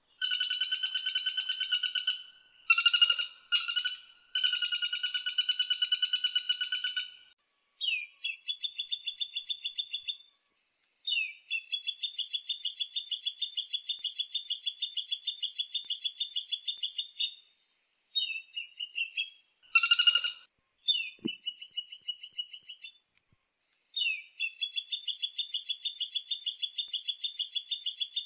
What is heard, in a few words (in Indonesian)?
Kombinasi pelatuk bawang caladi